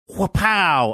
wapow

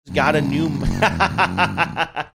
making snoring sound

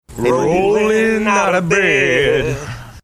overlapping clips singing rollin out of bed

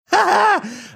haha

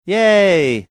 yay

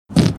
flarp sound

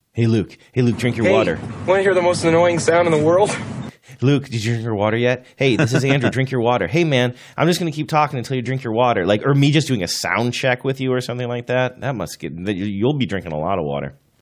water bottle voice

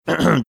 clearing his throat

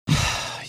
sighing

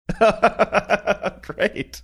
laughing oh great

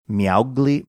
meow

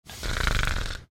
making snoring sound

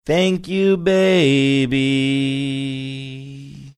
singing thank you baby

